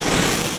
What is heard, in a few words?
Blow torch